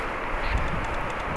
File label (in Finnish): kurpan ääni